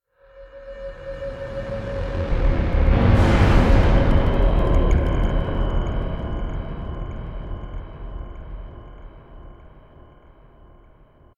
Stinger Swell 5 Seconds

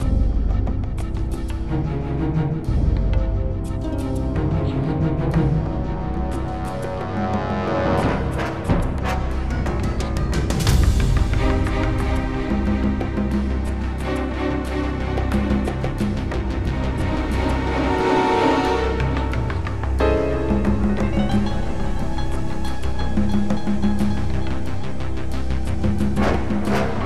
Battle Music; Platoon

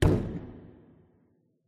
Vent Walking vent crawl

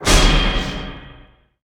Magic Spell Energy Ball

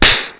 Slap; Talking Tom Cat

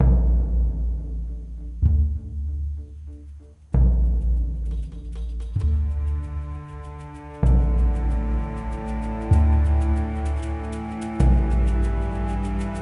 tribal; dragon riders chronicles of pern 2001 dreamcast gamerip

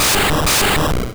Poisoned

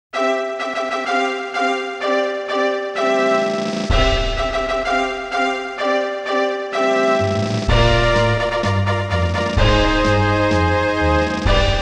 Fanfare - Special Race; koei original bgm collection vol.13; winning post; winning post 2

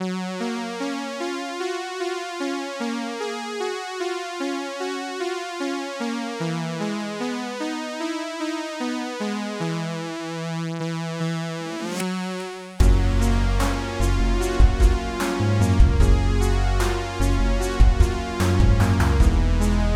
Beep Bot Groove

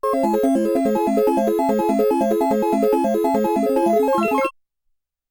Round Start Music; Phozon; psx

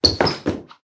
Run; silent hill homecoming; ps3

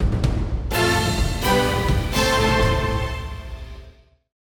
sting victory; super awesome rpg; android

